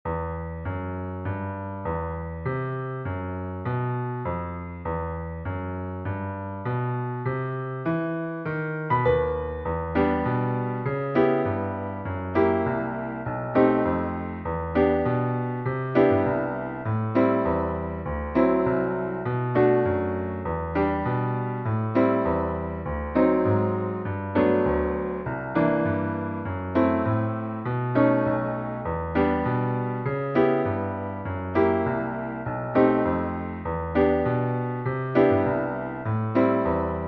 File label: Piano